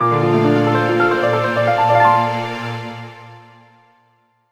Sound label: (Jingle) Fanfare